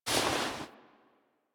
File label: Stand Up Foley; Call Of The Tempestarii; Wraith Quest; Reaper On Bridge